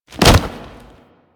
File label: Generic Grab; Warframe; Characters; Tenno